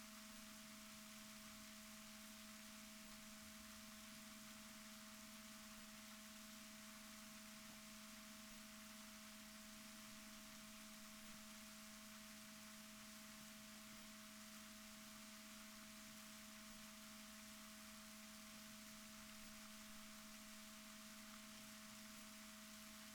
rorquals negatif, Balaenoptera Physalus Chile

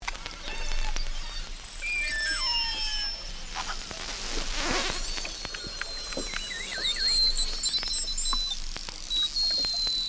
Beluga, Voices in the sea

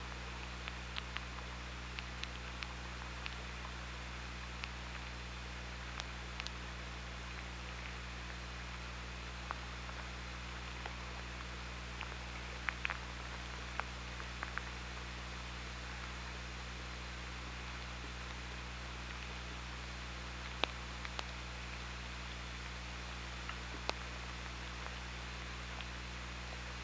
OrcaLab

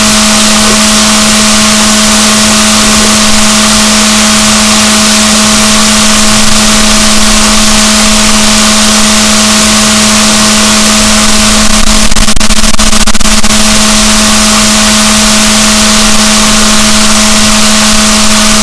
rorquals negatif, boat

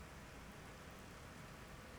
pulses, BALAENOPTERA; MONACO 2022-07

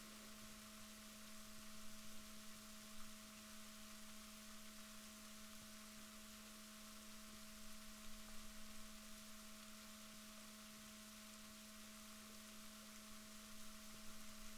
rorquals positif, Balaenoptera Physalus Chile

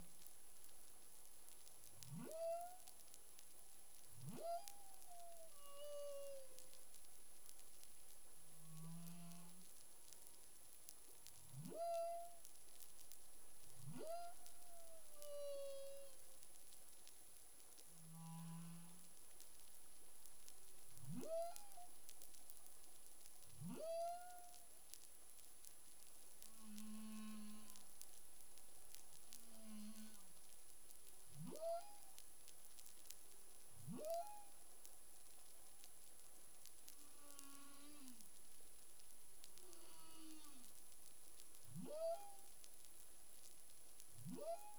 humpback whale; CARIMAM

